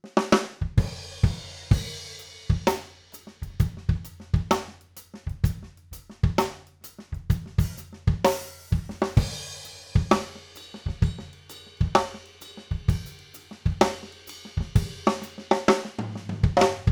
OH Rear Blumlein, overheads, drum; drum shootout

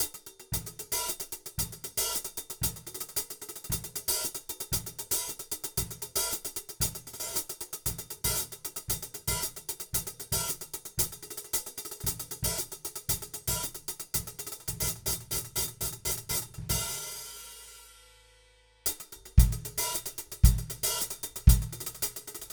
HiHat, KM84

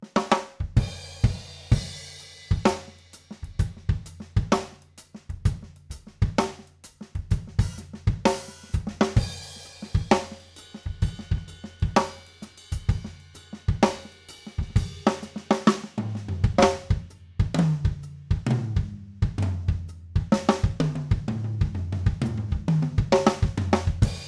OH Spaced Card, overheads, drum; drum shootout

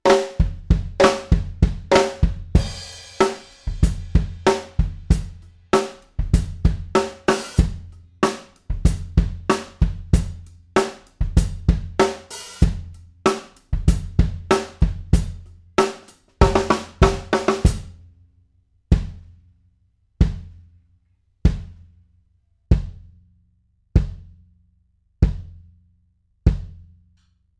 Kick fig 8, drum; drum shootout

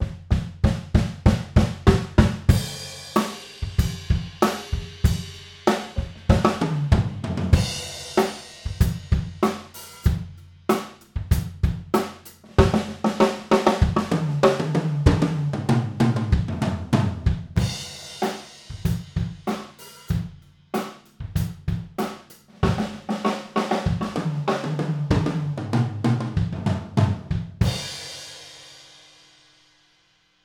Room Mono Fig 8, drum; drum shootout

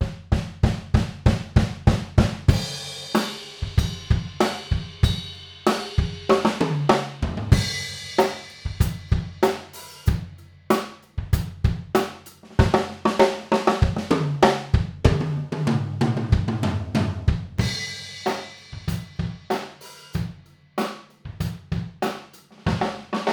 Room Mono Fig 8, drum; drum shootout

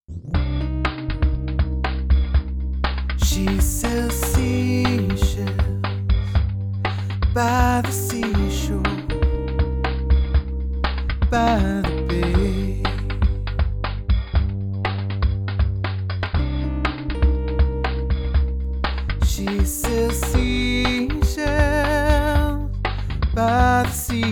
Tube Song; Mic-Mod Madness

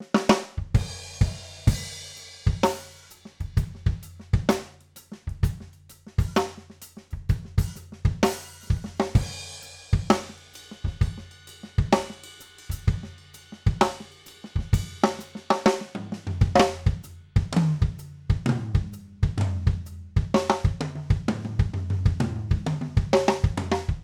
OH Spaced Card, overheads, drum; drum shootout

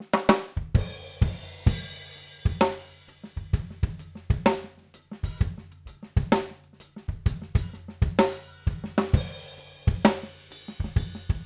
OH Mono Fig 8, overheads, drum; drum shootout

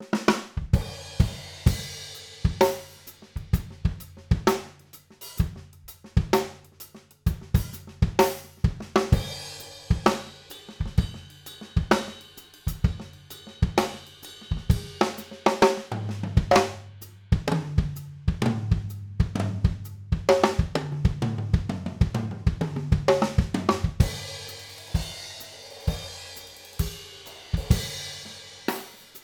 OH Mono Card, overheads, drum; drum shootout

